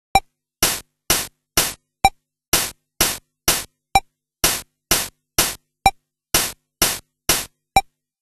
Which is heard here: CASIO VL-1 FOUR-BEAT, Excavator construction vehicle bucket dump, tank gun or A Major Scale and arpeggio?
CASIO VL-1 FOUR-BEAT